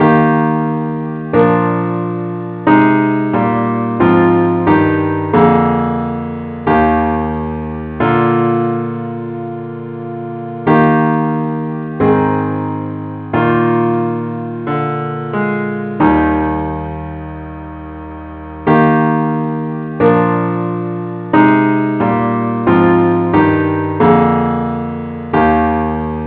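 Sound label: parts only key e flat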